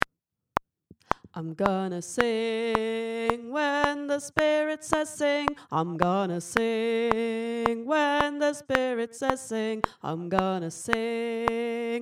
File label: Im gonna Sing Tenor